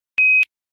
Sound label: Apollo NASA Radio Beep